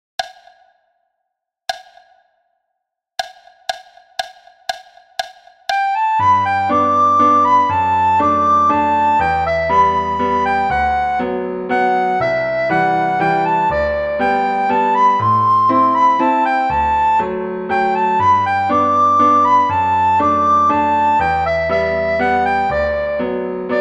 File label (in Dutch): sopraan; Flierefluiter 5